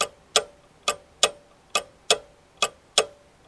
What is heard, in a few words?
clock, ambience; vendetta